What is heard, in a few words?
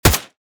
l86 fire; STALZONE